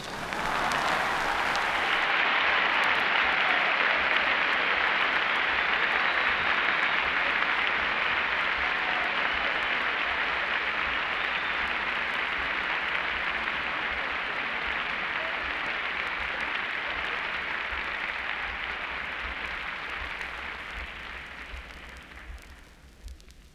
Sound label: Applause; Quartet Continues